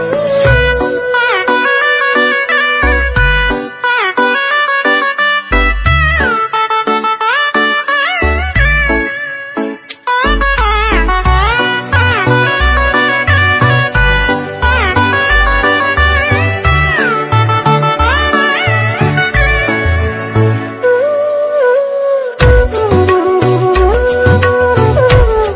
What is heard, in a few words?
stylish english ringtone